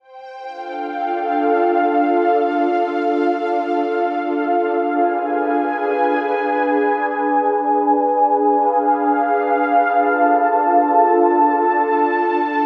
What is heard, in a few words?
Stringpad Aftertouch Velocity Realtime Knobs